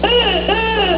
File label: duck; dot2dot